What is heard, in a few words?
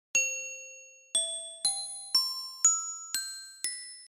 glockenspiel; گلوکن اشپیل